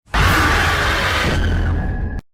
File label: ghost, scary sounds; dunree; scary sounds home